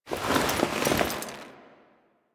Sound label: Move Foley Long; Warframe; The New War; Veso; Quests